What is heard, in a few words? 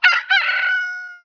crow, Weapons; Heretic II Demo